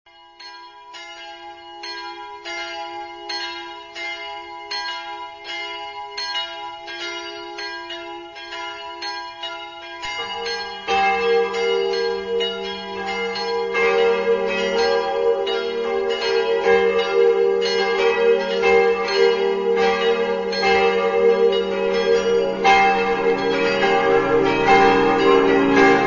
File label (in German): weichnachtsglocken